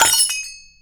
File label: BELLS